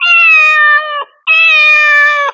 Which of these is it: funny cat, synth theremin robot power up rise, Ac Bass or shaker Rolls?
funny cat